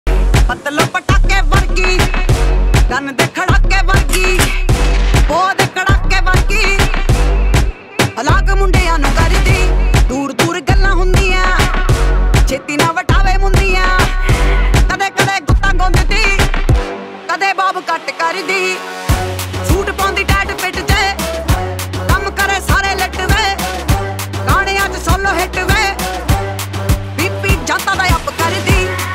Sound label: punjabi ringtone